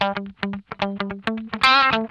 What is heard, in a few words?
HARD PICK, Funk Guitar; 90 s Sample CDs; Zero G